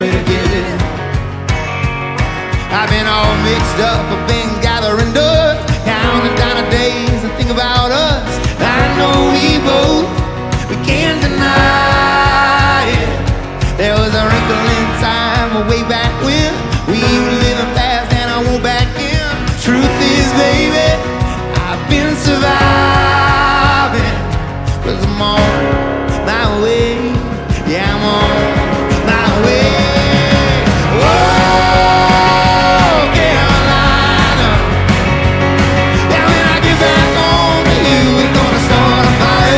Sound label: Acoustic Live